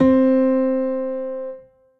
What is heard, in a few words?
Grand Piano Stretch Mellow Solo; Ableton; Factory Packs